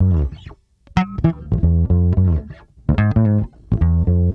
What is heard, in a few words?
Bass Loop, Ambient Grooves